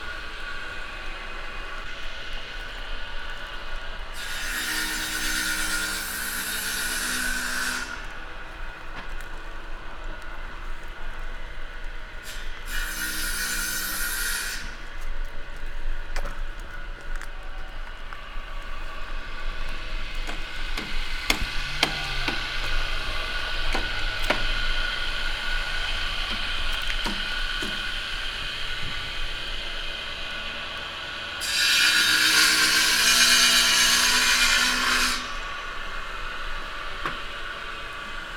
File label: Carpenter S Workshop Ambience